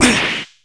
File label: damage; akihiko